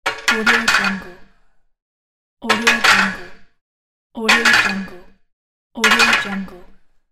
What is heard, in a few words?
gym straight bar put